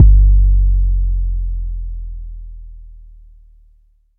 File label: phonk kicks oneshot 808 G; kick drum